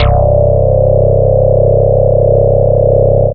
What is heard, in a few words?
Single Electric Basses, Dance Floor Bass